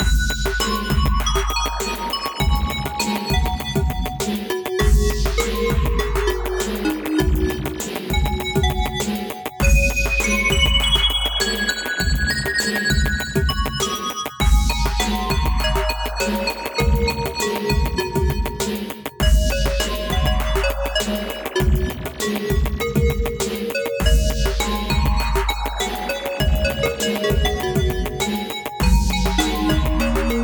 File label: Improv Penta with Drum